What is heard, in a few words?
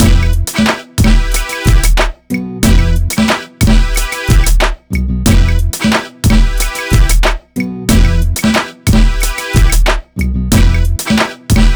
Type Beat